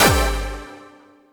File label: Points; Anarchy Splatcast